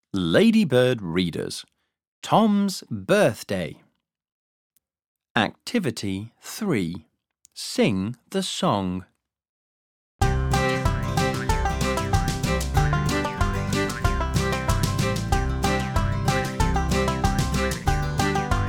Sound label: Birthday Song